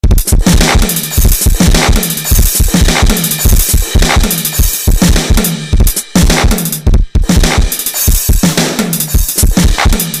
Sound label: Randomizer Beat; Deformer